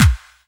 KIT, Dance Mega Drums; Best Service

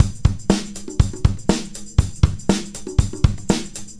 Combo, R&B Drums

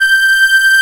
DIATONISC, AKKORDEON; Best Service; Alpine Volksmusik

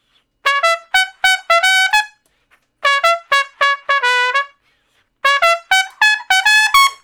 Trumpet Straight (Db); Crimson Blue and Fabulous Horncraft 4 RnB

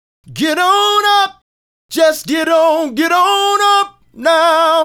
Male Solo, Vocal

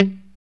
POP G 3, STRAT guitar; Spectrasonics; Hans Zimmer Guitars VOL-1; JC-STRAT POP

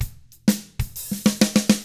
SHUFFLED, Drums; ILIO; Double Platinum Drums 1

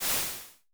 Crash Waves, Vermona Mono15 Drums

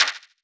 Puk, One Shots Hit; Sonic Foundry (Sony Creative Software) - Future Retro Dance Excursions

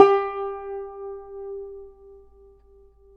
yayli tambur; Guitars; Spectrasonics; Hans Zimmer Guitars VOL-2

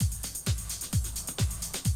130, Classic DrumLoops

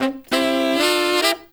SAX, W. AFR.; Spectrasonics; Heart of Africa

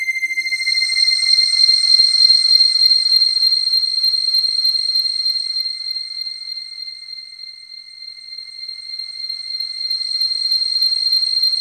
SNOWFALL; MORPHEUS

